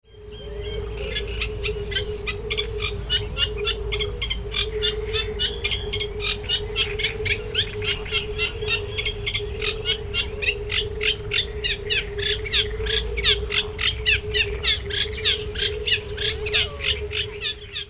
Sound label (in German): teichrohrsaenger